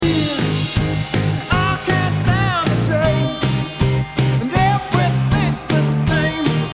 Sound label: Resonance Notched At 656Hz; Diesel 13 Mix Contest